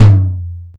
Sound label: Tom Normal